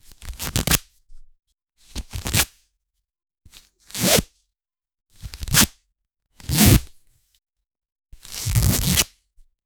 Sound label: detailed recording of vel, clothing